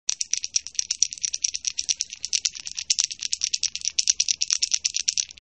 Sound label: DOG